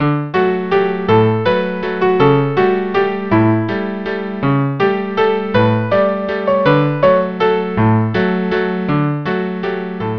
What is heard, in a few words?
quartet